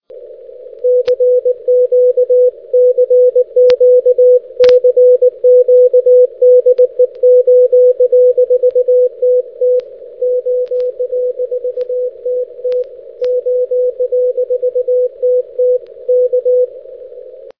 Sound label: 6m peak; OA4TT at his peak; N1BUG; 6 Meter